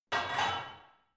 barbell only replace unsteady reverby, fitnessstudio; sport freizeit